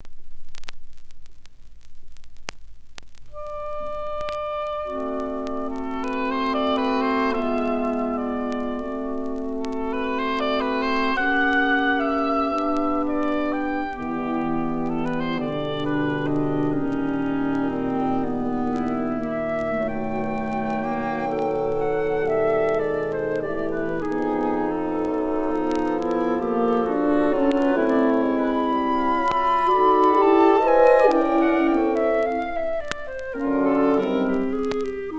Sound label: Five Pieces For Winds Instruments & Percussion; Op.83; Night Song